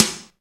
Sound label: MIX SD; AKAI S6000 CD-ROM - Volume 1